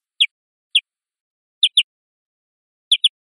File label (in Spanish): choneos sueltos, verderon